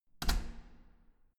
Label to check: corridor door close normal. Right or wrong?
Right